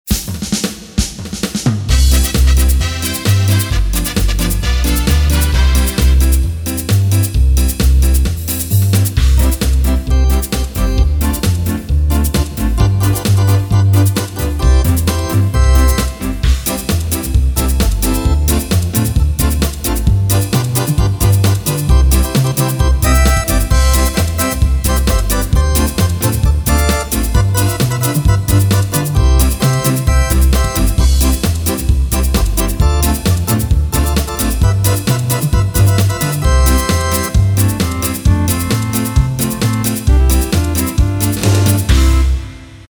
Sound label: Ska, Casio CTK-601, Rhythms